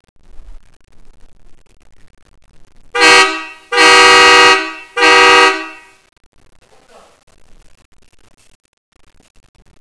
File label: airhorn